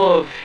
VOC, Drum & Bass; Ueberschall; Drum & Bass Flash Trackz

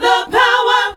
VOX SUNG; AMG Megabass REMIX! VOL-2

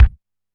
DM5 Kick, Alesis DM5 drum machine

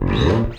FAST UP B, Electric Basses; PlatinumPhattCD2; E-MU Producer Series Vol. 8 – Platinum Phatt

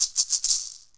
PUILI, HAWAII PERC

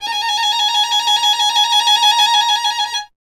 STR VLN, Solo Instruments; Miroslav Vitous

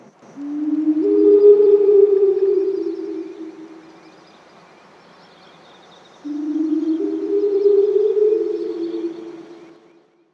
Enviornmental

